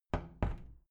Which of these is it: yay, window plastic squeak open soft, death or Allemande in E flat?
window plastic squeak open soft